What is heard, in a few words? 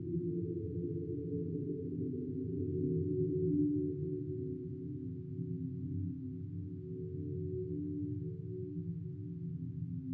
Angels behind the wall, Dreamscapes; Digital Rain Lab; Dreamscapes vol.2